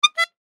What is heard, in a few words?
Bicycle Horn Honk, Bicycle Horn Honk Bike; Bicycles; SoundEffects-Blastwave; Sports